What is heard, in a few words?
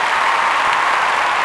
Amb Crowd; Cars; XBOX